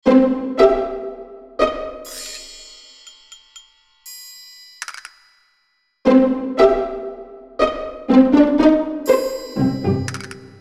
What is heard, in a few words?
quirky cartoon music